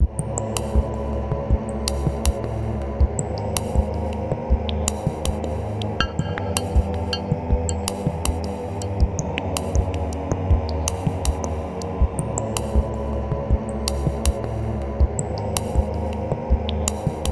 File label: Level Music dark; Fireboy and Watergirl